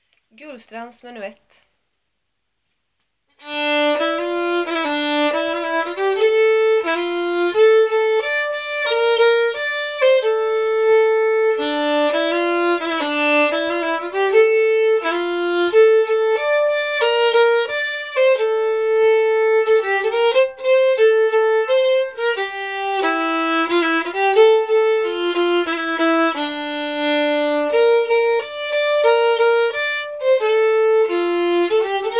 menuett; Guldstrands

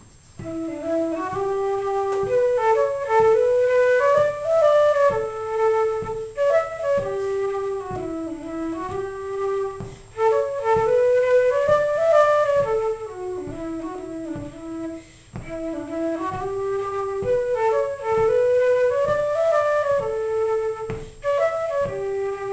Härjedalsschottis

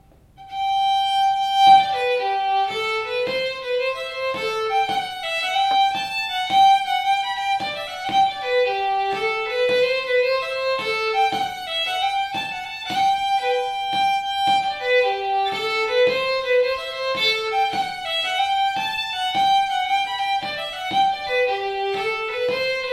Polska från Svanskog Värmland

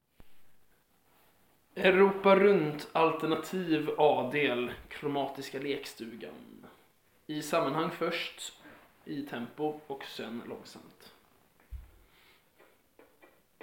kromatisk lekstuga; EU runt

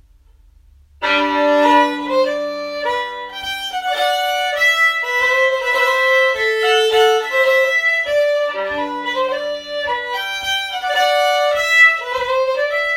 polska, melodi; Lustspels 15-årspolska

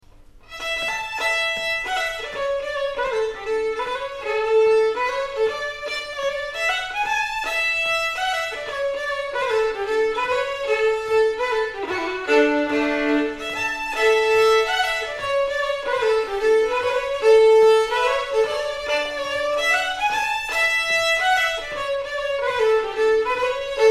schottis

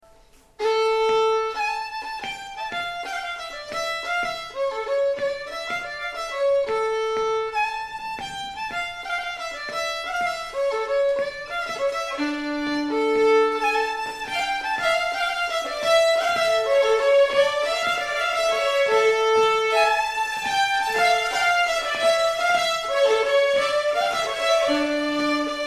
polska

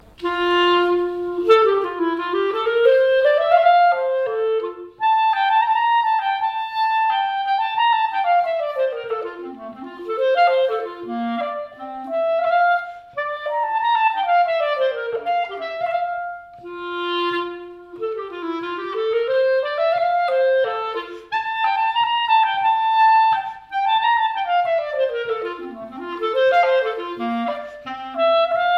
Polska; efter Blomgren

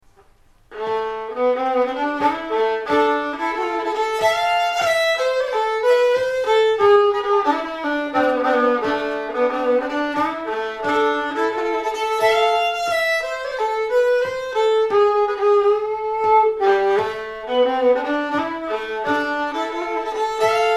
polska

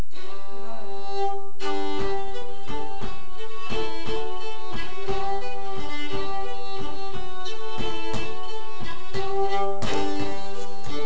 Mazurka från Jämjö, Blekinge

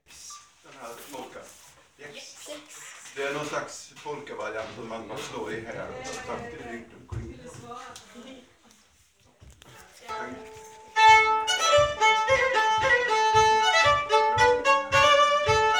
Polka; utlärd av Mats Edén

